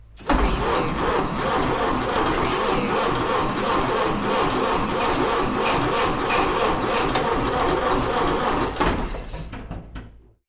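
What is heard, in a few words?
Model T Ignition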